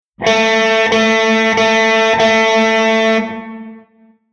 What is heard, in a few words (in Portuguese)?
Sustain EQUILIBRADO